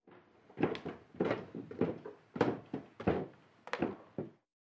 Footsteps Walking, wood

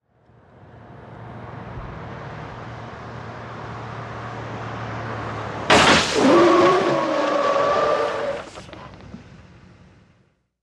Auto Blow Out Skid, blowouts

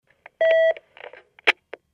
Intercom Beep Line Ha, futz intercoms

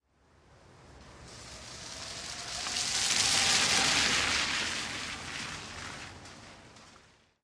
Car By Medium Speed On

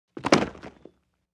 Body Fall Very Short

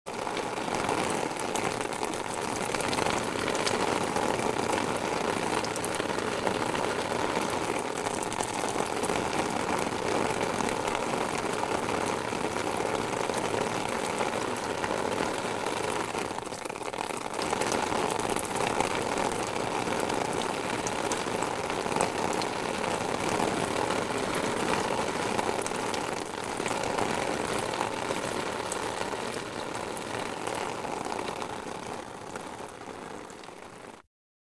Rain Steady Rain On, raindrops, splatty

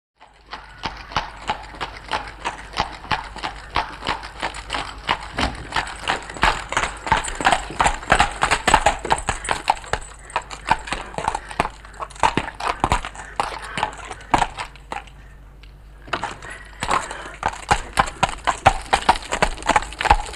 By Horse Cab Up Stop At

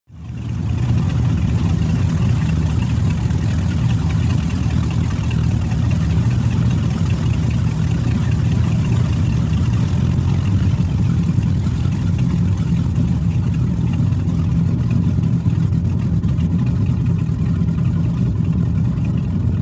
Power Boats Idle, off shore power boat

